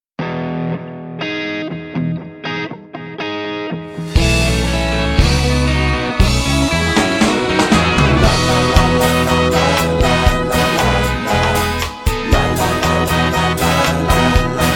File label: Reggae